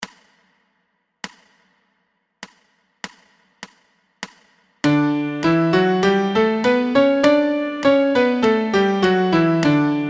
MIDI Major Scale D

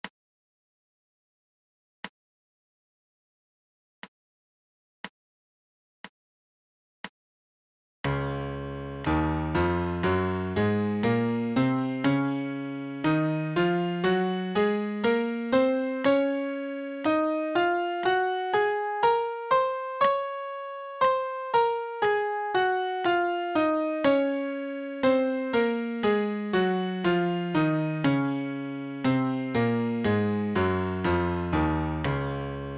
MIDI Major Scale Db 60; Piano Practice Tracks

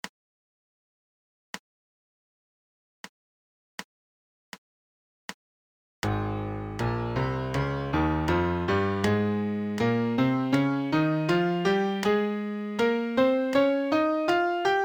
MIDI Major Scale Ab 80; Piano Practice Tracks

